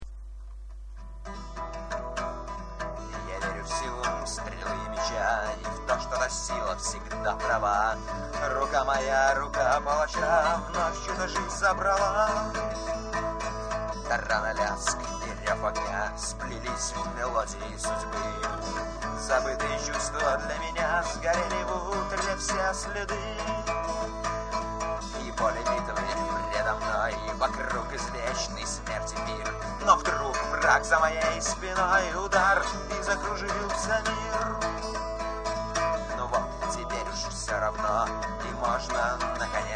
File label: Steel